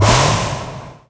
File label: bounce; Drunken Robot Pornography; Inago Rage